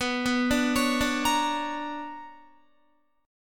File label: B Minor Major 9th, Guitar; BmM9 arpeggiated; JGuitar